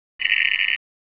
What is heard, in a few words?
synth screech